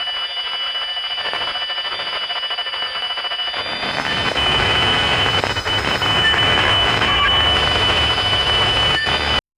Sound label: extreme digital decay, glitch